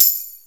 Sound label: Percs